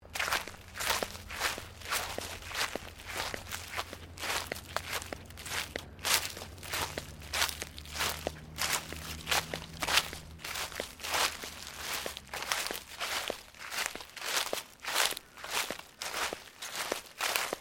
FOOTSTEPS THONGS (FLIPFLOPS) GRASS LEAVES SEQUENCE; Footstep Foley; Footsteps (Sound Effects:Human)